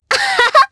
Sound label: Laugh, jp; Sonia; kings raid